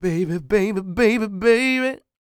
SING, Vocal Planet Blues Soul BLUESY; Spectrasonics

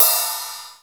STD RIDE, MPC-60 DRUMS; Greytsounds VOL-1

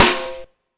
SD, dance drums hip; 90 s Sample CDs; Best Service Dance Mega Drums

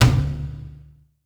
Bent Tom, Percussion Hits; Zero-G; Soundclash; Acidized Wavs

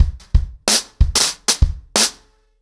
90BPM, ACOUSTIC DRUMS

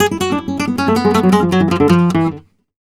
gta e, Flamenco, Guitar, Picado

